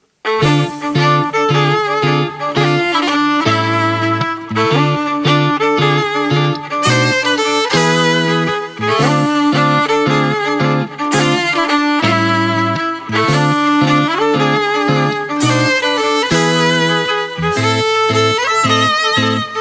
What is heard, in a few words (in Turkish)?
Hikaye Başlasın (Violin)